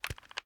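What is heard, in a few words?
foley rechamber end; weapons; gmod; viper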